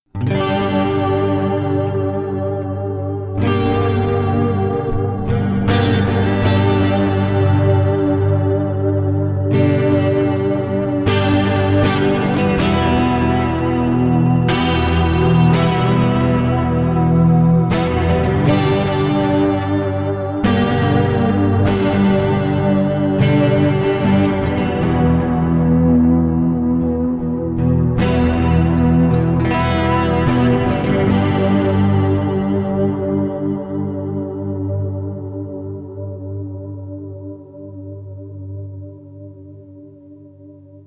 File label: Delayed Geetar